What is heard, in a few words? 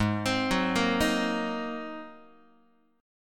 G sharp 9th G sharp Guitar; G#9 arpeggiated; JGuitar